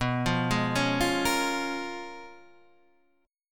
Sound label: C sharp 13th B